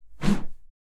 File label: Whoosh, Organic, Styrofoam, Plank, Airy, Smooth, Texture; Airborne Sound; Organic Whooshes